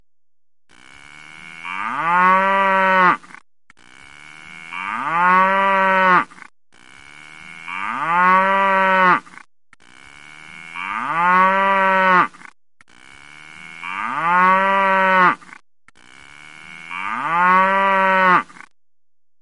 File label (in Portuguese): Muuuuczando